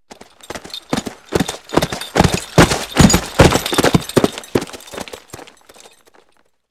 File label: Gallop; Inquisitor 3